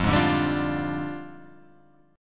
F Minor 6th Add 9th F down; JGuitar

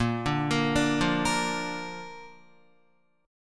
Bb Major, Guitar; 6,5,8,7,5,5; 4E,4A,5D,5G,5C,6F; Bb arpeggiated; JGuitar

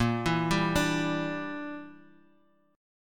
A sharp 6th Flat 5th, Bass Standard; A#6b5 arpeggiated; JGuitar

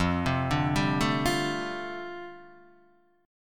F 6th Add 9th F down Guitar DADGAD, chord

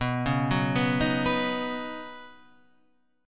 Eb Minor 7th Sharp 5th B

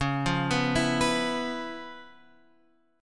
C sharp 7th Sharp 9th C sharp Guitar Open F; arpeggiated; C#7#9; x,8,5,6,7,6; JGuitar

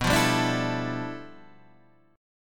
G 13th B, chord

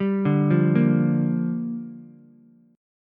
G Minor 7th, Ukulele; Gm7 arpeggiated; JGuitar

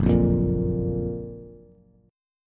F sharp Major 7th, Bass; strummed; JGuitar

